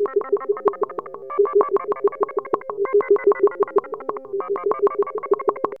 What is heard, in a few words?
RATTLE, RHYTHM; AMG - Vince Clarke Lucky Bastard